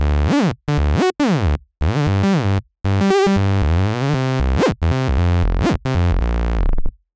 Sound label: bass